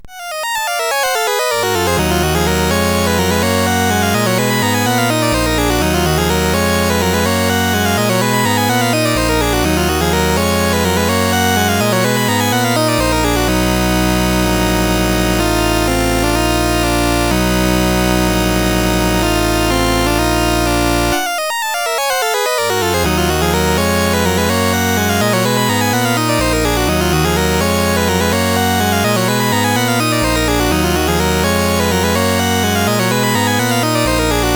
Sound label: Dark Castle, CSG8580